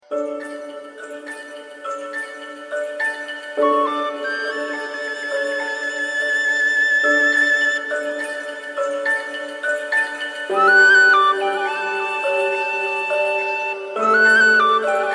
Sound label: West Winds, alarmas; LG